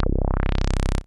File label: ANALOG, BASS; Best Service; Hyper Dance